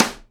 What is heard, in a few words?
SIDE STICK, Live Snares